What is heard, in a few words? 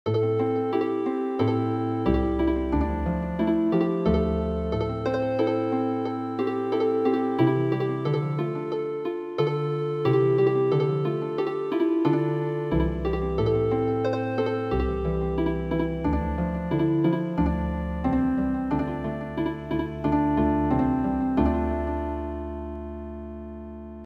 Healing Harp, traditional